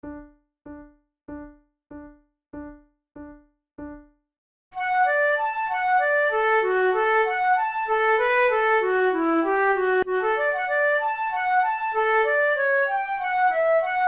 duet, flute

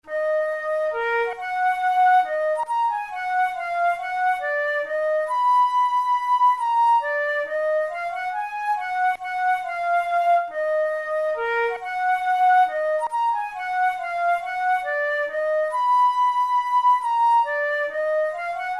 flute studies in e flat minor; dothel

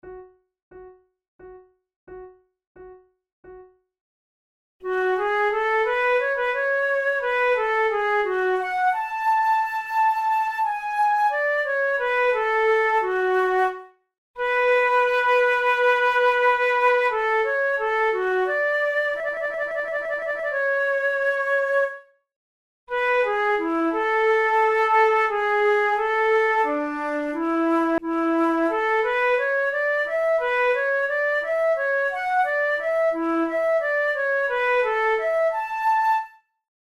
sonata for two flutes; in a major; gratioso

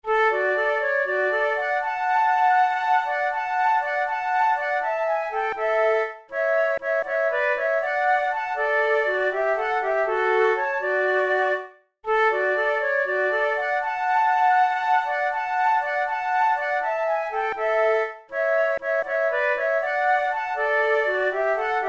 auber diavolo duet, flute

